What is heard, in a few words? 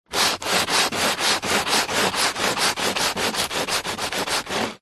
industrial sanding large piece of wood sand paper med